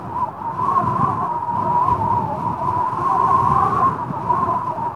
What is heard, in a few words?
Wind Whistling Loop; weather fx; Silent Hill Downpour